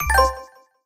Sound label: Collectibles Classic FX; Video Game; Cymatics; Video Game Sample Pack Vol 1